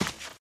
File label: gravel, footsteps, walk; player; source engine; left 4 dead 2; boomer